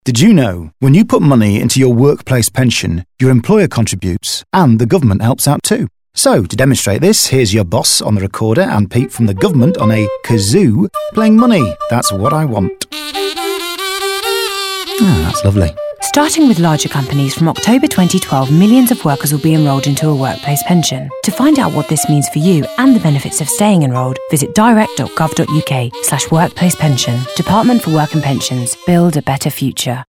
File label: Recorder And Kazoo; DWP